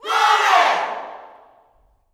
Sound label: "GLORY", Gospel, YELL SHOUT; Spectrasonics; Vocal Planet Vol.1 Gospel; akai